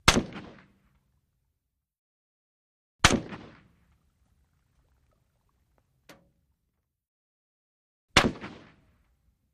Russian Sks: Single Shots. Dual

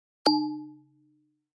Flash Beep Elect Ping

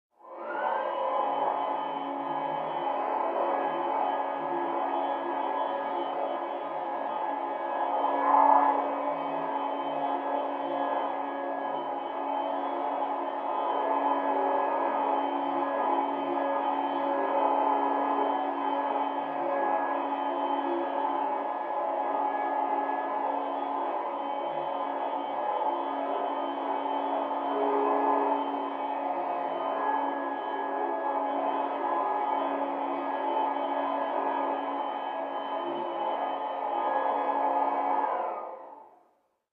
Vacuum Various Move; fans

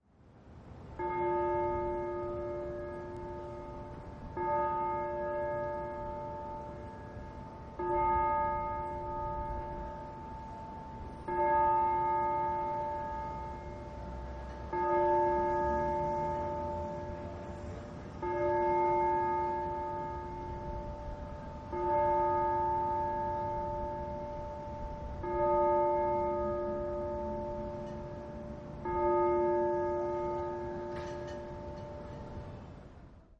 City Church Bells Low, bell towers

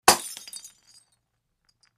Light Bulb Smash

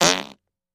Close Up Fart; farts

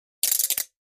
Mill Clack Wooden, percussion shaker

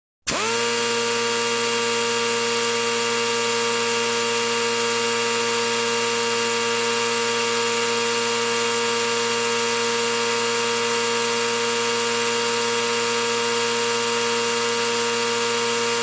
Small Pneumatic Drill

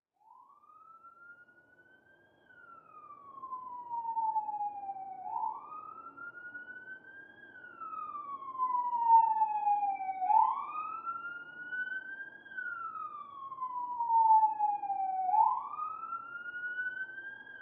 Siren Slow Police

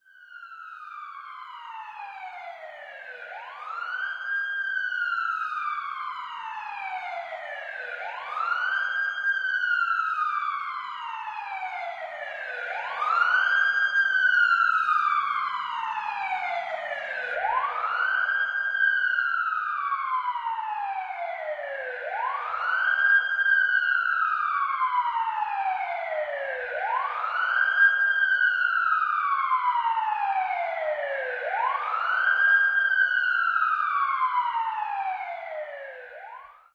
Police Siren: In Tunnel with Various Types.

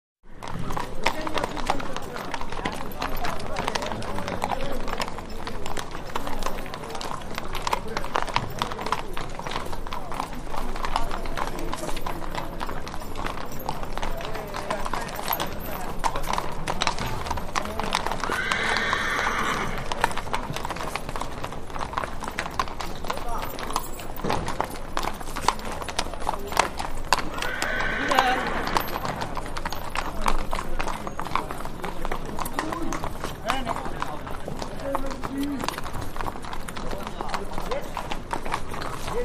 City Street Hooves

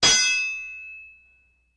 Hammer Hitting An Anvil

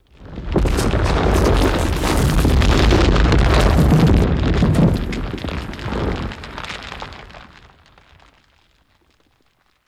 Earthquake Rock Debr

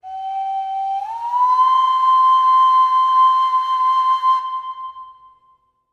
Columbian Flute

